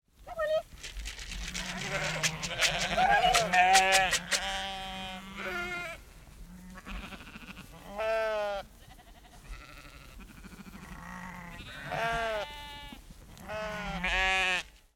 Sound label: animals sheep herd walk close bleating farmer female shakes food calls sheep